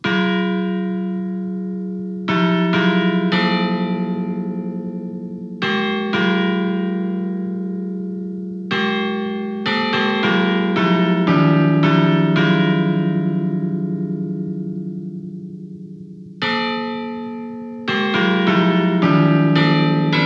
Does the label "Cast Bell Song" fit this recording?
Yes